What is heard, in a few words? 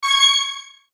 chime high, Chime High Shimmer Swell; Chime High Shimmer Swell Production Element Imaging Element Accent Transition; Multimedia; Chimes; Blastwave